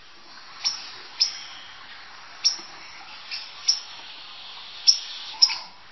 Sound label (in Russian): ostorozhnij pisk drozdovogo pevuna v prirode